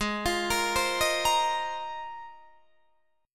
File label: G sharp 6th Add 9th, Guitar, Open D; down; chord shape